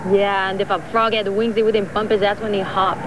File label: FROG WING